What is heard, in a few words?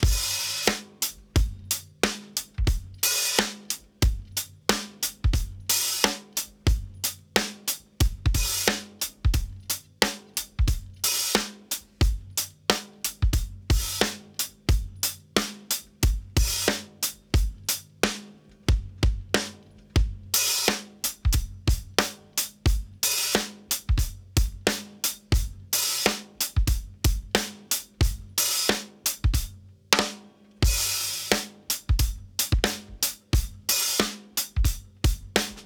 Loose Beat, drums loops; BASEMENT DRUMS VOL.1